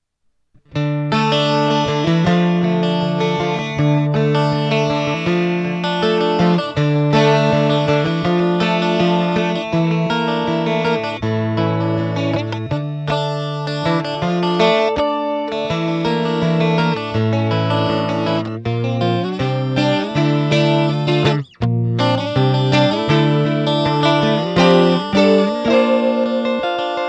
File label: bouzouki